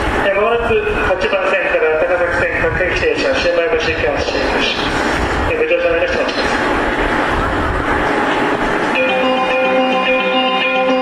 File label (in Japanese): 小山・宇都宮方面; 宇都宮線